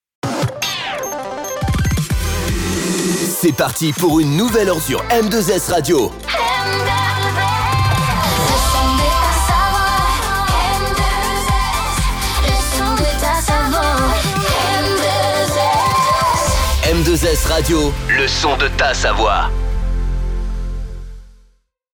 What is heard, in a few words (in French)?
Top H Chante No Cage